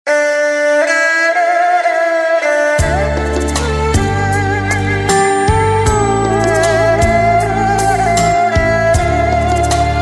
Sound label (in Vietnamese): beat